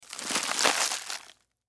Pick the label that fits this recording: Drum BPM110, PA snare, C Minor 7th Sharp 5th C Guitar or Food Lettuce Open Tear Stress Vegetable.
Food Lettuce Open Tear Stress Vegetable